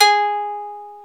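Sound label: ethnic plucks; Eth Plucks, Pluck Duo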